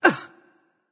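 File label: grunt hit, female; smite; voicepack; hera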